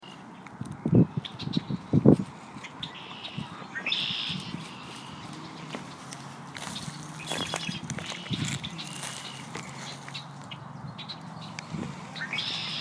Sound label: Bird Songs